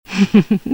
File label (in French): Rire femme coquine